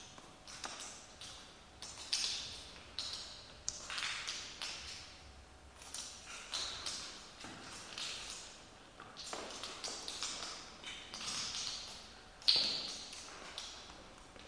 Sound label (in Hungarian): barlang csepeg ambience; CRC 2005 Demo